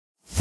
Dubstep Snare (Pre Snare); Ghosthack; Dubstep Sound Arsenal

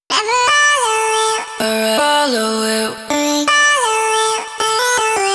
Vocal Chop 160 BPM G Maj; Cymatics; Euphoria Vocal Sample Pack

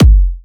Kick, Techno, One-Shots, Drums

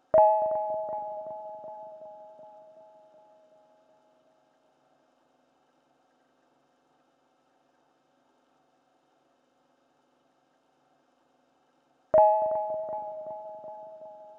80 BPM G# Min Pluck; Melody Loops; Ambient; Moments; Cymatics; ANALOG EVOLUTION Beta; Ambient Collection

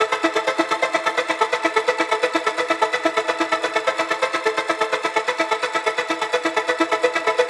Melodic Loop Amin 128, Melodic Techno; Sparkle; Ghosthack; AC2024 - Day 12 - Melodic Techno

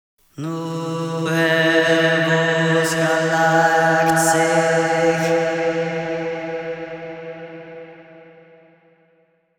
Chant Vocals Am 70Bpm (WET); Construction Kits; Ghosthack; SkyRealm; Kit 20 - Sea Of Souls

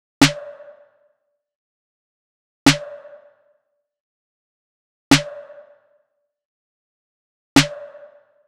Drum Loop, 98 BPM Snare; Loop Stems; Cymatics; Vibes II Drum Loop Collection

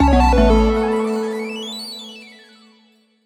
Menu FX Chiptune - A6(Sus2); Video Game; Cymatics; Video Game Sample Pack Vol 1

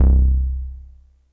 Prophet REV2 bass flame - C; One Shots; Cymatics; Fantasy Synth Sample Pack

